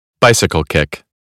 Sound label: bicycle kick